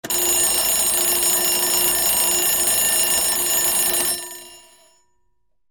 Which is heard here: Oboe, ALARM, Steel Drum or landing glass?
ALARM